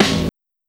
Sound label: 00s low tone snare sound a key; tuned snare